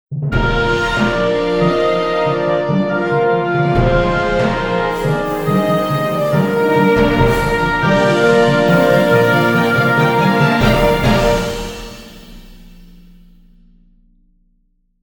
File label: aventure jingle